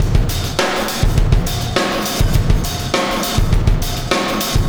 Wormhole Beat, Processed Drumkits

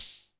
Electro Percussion, House; Drums

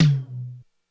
Med Tom, Korg DSS-1 Drums

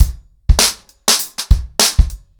100BPM, ACOUSTIC DRUMS

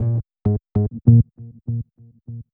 SYNT, funk; USB Soundscan vol.47; AKAI; Hip-Hop & R&B

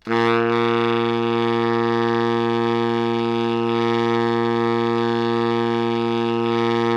BARI PP A#1, Sax; Giga Samples Collection; BARITONE PP

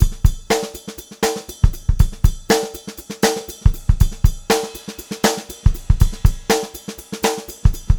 FUNKY, Funk Groove Drumloops; USB Soundscan vol.38; AKAI; 90 s Sample CDs; 120 FUNKY

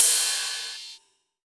Rides, Cymbals

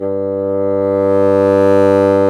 Bassoons

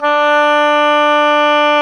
oboe, Oboe Brth

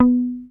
HOFNER MUTE, Bass

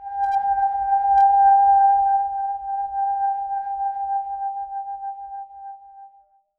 wind hose

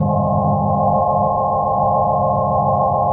Organ, Drum & Bass Keys; Sonic Foundry (Sony Creative Software); Knutrix Drum & Bass

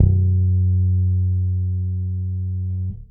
Harmonic F#, Bass; Construction Kit; One Shots; Bass X

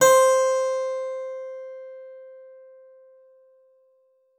SPIN B4, spinet; Xsample VOL-7

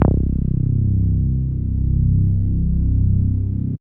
Bass Note C

